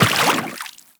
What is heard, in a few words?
Step Water, Footsteps; Warframe; Teshin